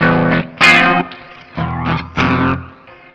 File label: Guitar, Drum N Bass; Sonic Foundry (Sony Creative Software); Knutrix Drum & Bass